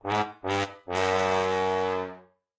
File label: Trumpet Sound; Undertale; Sans